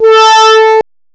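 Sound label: brass ensemble, analog; CMI Factory; M8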